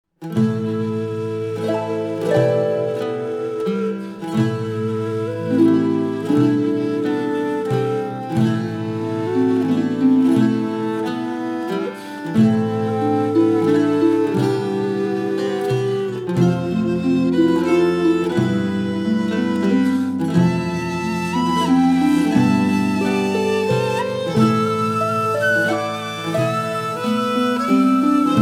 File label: Tavern; Two Worlds 2